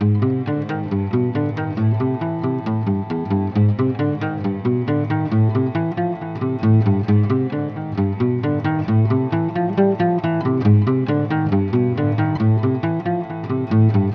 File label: guitar and strings, Sad